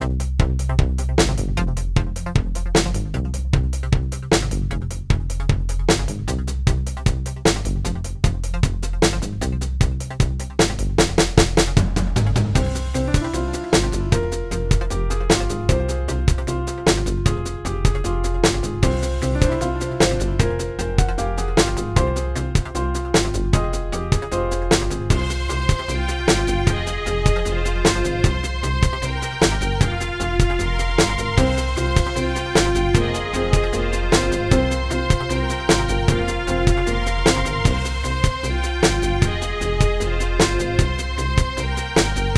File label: electric walk